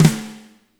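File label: fat snare right; Spectrasonics; Burning Grooves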